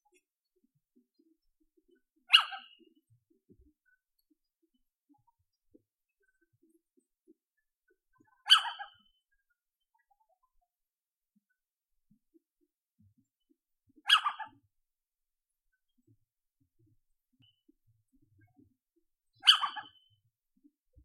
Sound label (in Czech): Sycek obecny samice